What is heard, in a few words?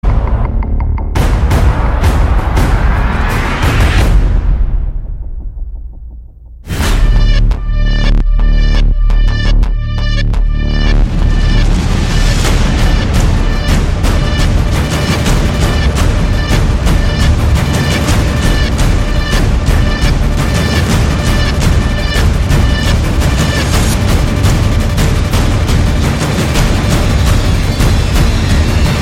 Hitsound